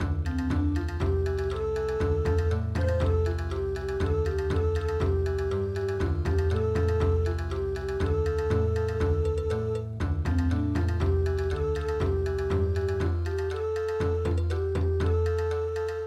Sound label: nyanya ichinohe bon